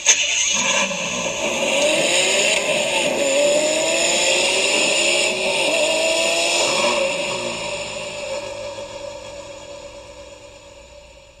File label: GTC4LUSSO T, Ferrari